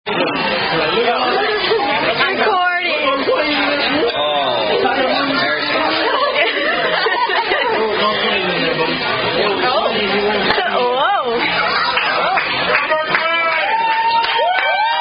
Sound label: Happy Birthday, Led By Wait Staff; Happy Birthday (Standard) Led By Chili's Wait Staff